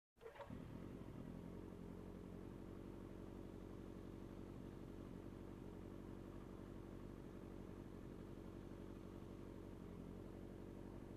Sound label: Motorcycle approaching and turning off engine